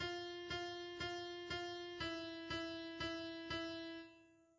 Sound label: soprano 4 beats